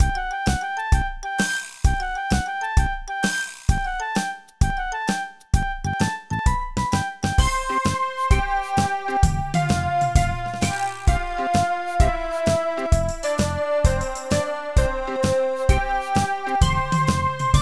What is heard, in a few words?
Energetic Mood; Boku Doraemon; Dreamcast